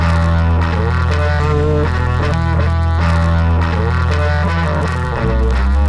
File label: guitar, Loops, Phonky